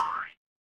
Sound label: cartoon boing